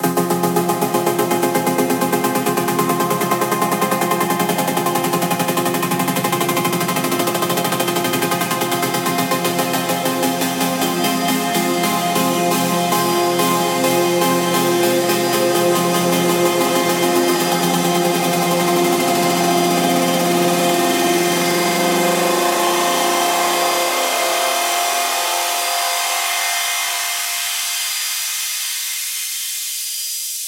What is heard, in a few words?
Serum LD Break